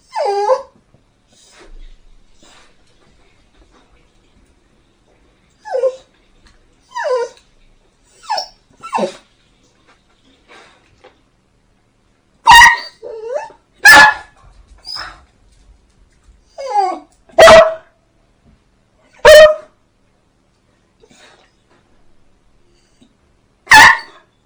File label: Bell